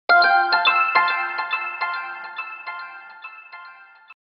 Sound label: boot audio, android